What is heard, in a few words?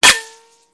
metal; Mafie 1